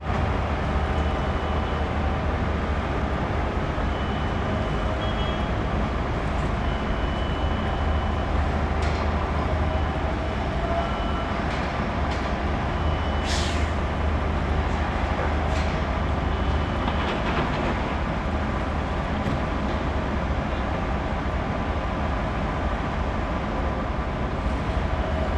industrial construction site at port light waves lap on slipway